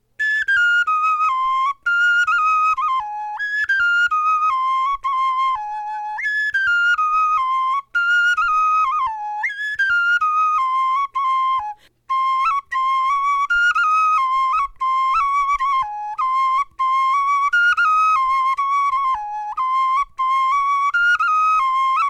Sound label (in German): melodie